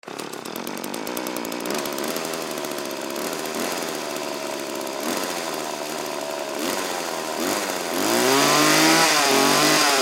Chainsaw On Run Off, Chainsaw 35 Cc 18 Inch Start Up Run Idle Rev Engine Turn Off; Saws; Industry; Blastwave